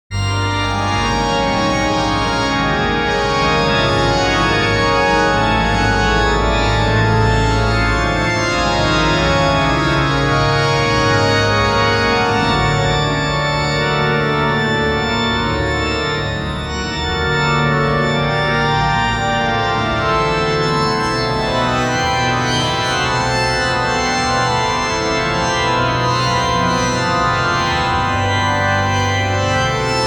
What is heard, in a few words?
haunting medieval church, organ